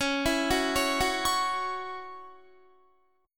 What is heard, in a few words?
Db Minor Double Flat 5th Db Guitar; arpeggiated; Dbmbb5; JGuitar